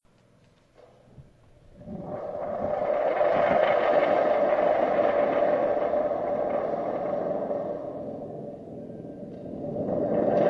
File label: Thunder Drum, A Thunder Gourd is a hand-held percussion instrument that is made out of a gourd, with a spring attached to the drumhead.; When the gourd is shaken, it creates vibrations that are amplifi